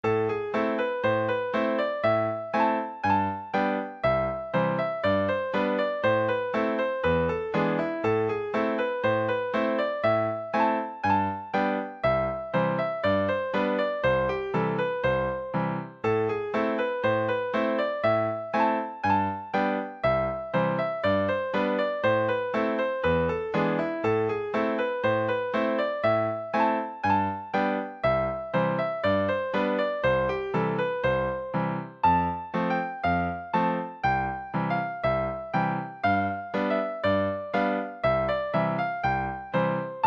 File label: hungarian gypsy tune, session tune midis